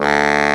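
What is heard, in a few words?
BARRI D 2, SAX; AKAI S-Series CD-ROM Sound Library VOL-8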